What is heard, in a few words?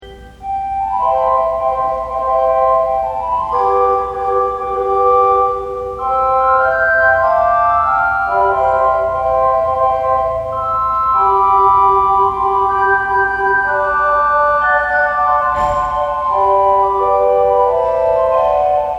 Flute; Haydn